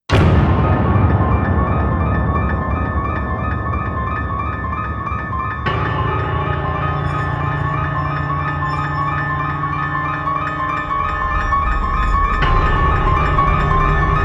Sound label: Keys